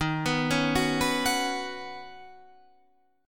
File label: Eb Minor 7th Sharp 5th Eb